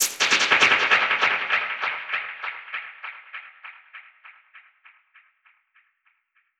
Perc Hit, dub percussion one shots; musicradar

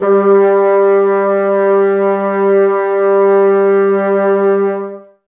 BNS G, Bassoons, Wood Winds; Miroslav Vitous - Woodwind Brass Ensemble

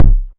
808 Kick; Novation Drumstation; Novation DrumstationV1

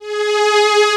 ST G3, SYNTHESIZER ANA STRINGS; AKAI S6000 CD-ROM - Volume 1

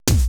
drum d1, drum computer, 80s drum set; Best Service ProSamples vol.55; Retro Sampler

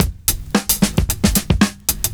70s Breakbeats; USB Soundscan vol.46; AKAI

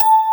DG WAVE, DIGI SYN

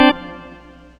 Organ Note; Jungle; Keys; Zero-G Jungle Warfare 1, 2 & 3; 90 s Sample CDs

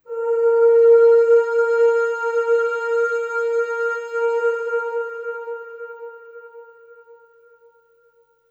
Ooh Long A#3 Long Reverb; Harmonic Building Blocks

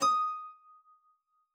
D6, HARP PIANO; Xsample VOL-8

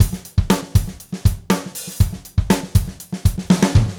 Gretsch groove 120 BPM, 1967 Gretsch Kit drums; Sonic Foundry (Sony Creative Software); Vital Drums

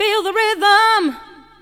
VOX SUNG; Megabass Remix! 2

